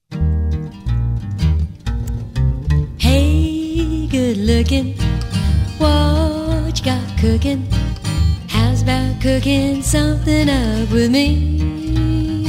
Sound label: Country